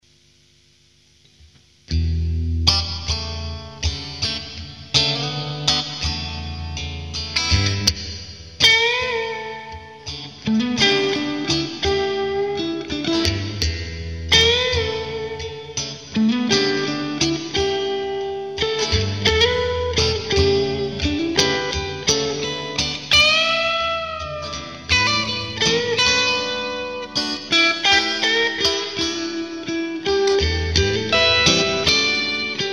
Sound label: Mitte Marsh Stalone Push, strat66